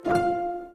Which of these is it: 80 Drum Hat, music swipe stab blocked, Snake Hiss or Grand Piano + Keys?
music swipe stab blocked